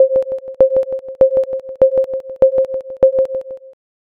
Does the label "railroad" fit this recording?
Yes